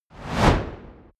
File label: fire torch bright whoosh; swooshes-swishes